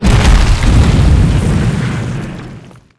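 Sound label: Cast skill Frost Nova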